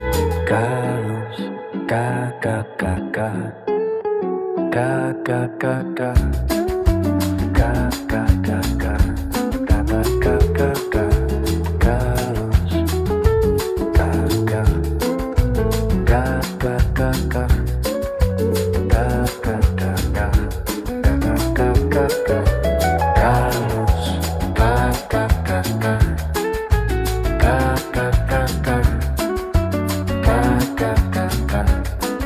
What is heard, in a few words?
bossa nova